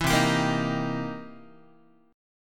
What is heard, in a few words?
C 7th Sharp 9th, C, down, Guitar; strummed; 4A,4E,5A,5D,5G#,6C#; chord; 7,8,10,8,7,x; JGuitar